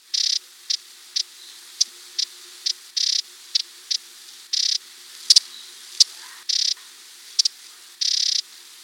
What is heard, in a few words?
broadbilled humm